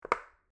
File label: arena closer fire; weapons